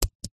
technology computer apple magic keyboard space bar press